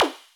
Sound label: Synth perc; musicradar; cartoon caper